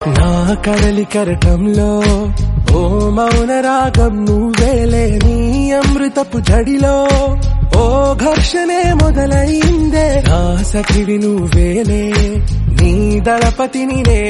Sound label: Telugu Song Ringtone; Kushi